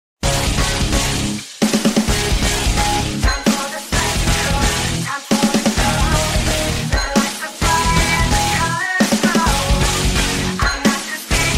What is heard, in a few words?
Metal Accompaniment